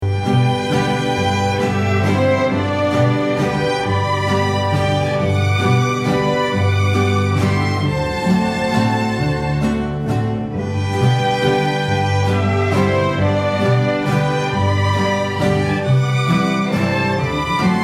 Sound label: Orchestral w vox; Flor+Rosita; On the Wings of a Mariposa